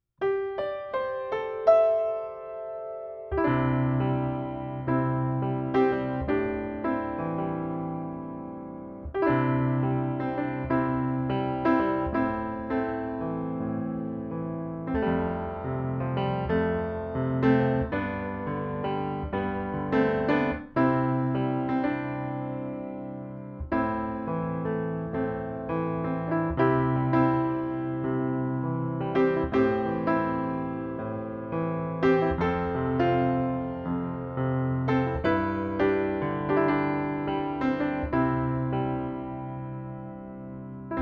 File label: Piano Ribbon